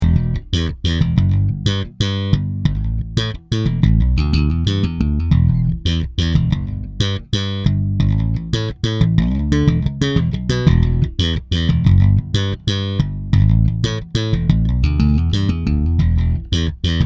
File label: Peavey Headliner 1000